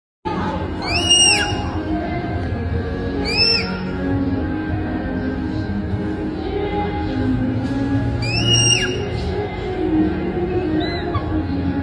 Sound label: Red panda; پاندای سرخ